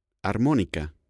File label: musical instruments